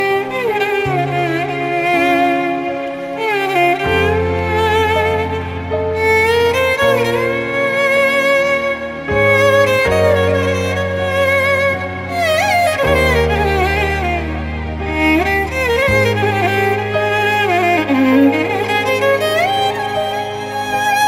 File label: piano music ringtone, bollywood ringtones